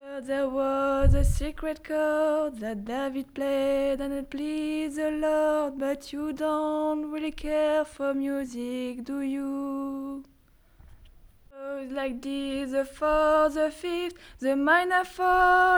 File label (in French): VOIX HALLELUYA